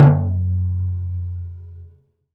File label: Prepared Drums; Drum Kit Samples; Sonic Foundry (Sony Creative Software); The Electronic Music Manuscript Collection; Battery 3 Sample Kits